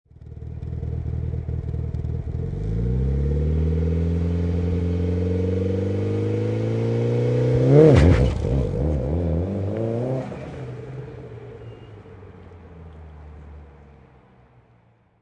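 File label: Mitsubishi Evolution Exhaust Takeoff, Auto, Power Car, High Performance Car Sound; Exhaust perspective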